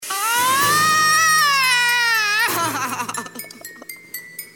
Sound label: scream